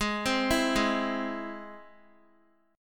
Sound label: Ab Augmented Ab, Guitar, 4E, 4A, 5D, 5G, 5C, 6F; Ab+ arpeggiated